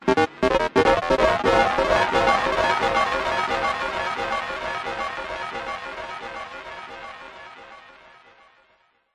synth melody sci fi space sting distorted glitches; jessey drake